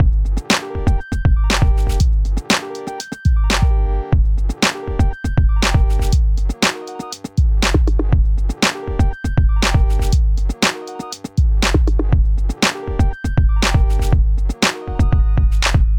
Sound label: Molton Busscomp